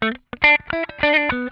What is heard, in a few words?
PICK IT, Funk Guitar; Zero G - Funk Guitar